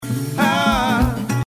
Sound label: ahhh